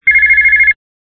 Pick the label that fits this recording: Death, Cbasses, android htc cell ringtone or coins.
android htc cell ringtone